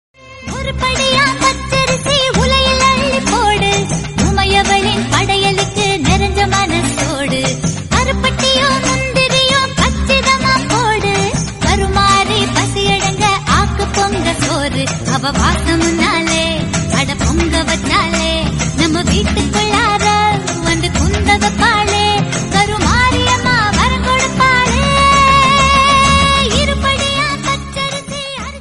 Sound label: song, amman devotional